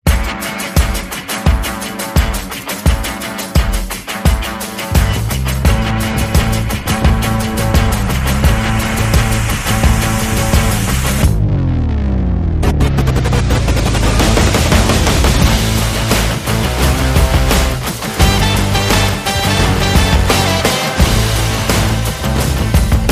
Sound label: Light Percussion